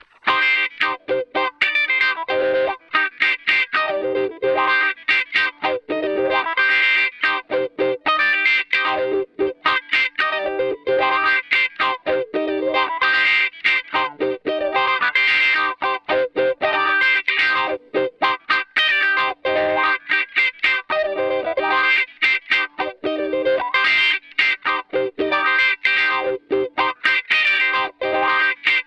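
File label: 112 Cm DISCO ELECTRO guitar, 112 BPM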